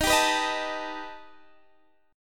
B Augmented 7th Eb

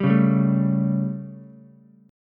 G Suspended 2nd Flat 5th G Ukulele; Gsus2b5 strummed; JGuitar

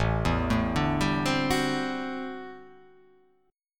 A Minor 13th A, Chord; Am13 arpeggiated; JGuitar

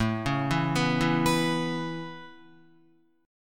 A Major Add 9th A Guitar, chord; Aadd9 arpeggiated; JGuitar

